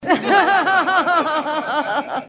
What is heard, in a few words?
woman laughing; 310 million lottery winner